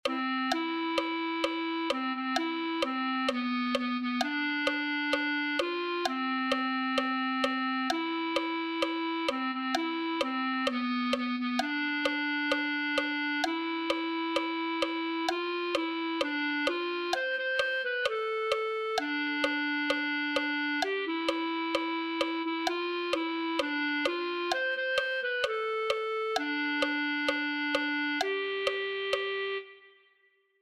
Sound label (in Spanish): si el mismo espiritu clarinete